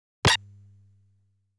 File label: Boss Damaged